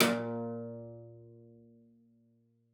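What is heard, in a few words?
CELLO spiccato A#2; 90 s Sample CDs; Xsample VOL-5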